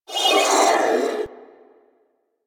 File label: Sentient Symbilyst Pain; Enemies; Barks